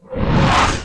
Slam; ImpGal2; groundwar

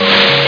sshh

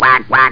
QUACK; hyperball

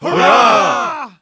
Shout; Waterloo

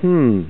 Hmm; Alley 19 v1.11

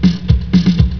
drum pat

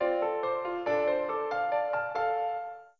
Piano Theme, ringtone

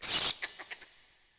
RAT; UrbanChaos

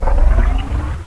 zoom in; duke nukem total meltdown

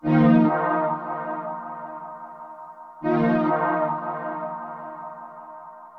SYNTH PAD AMBNT 160 C, Ambient; eJay; Sphere

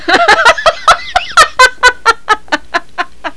Girl Laugh - Loud; Olduvai Sounds

